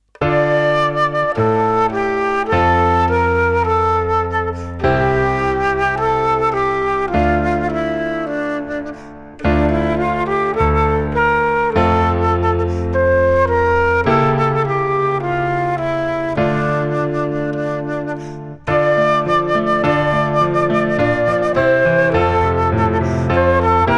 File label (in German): Etuede d moll